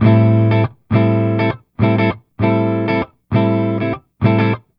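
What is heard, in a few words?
Jazz Guitar Chords, Amin7, 100 bpm; Fusion; Pro Tools Essential Loops; Digidesign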